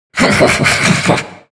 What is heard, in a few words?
broo laugh; broodmother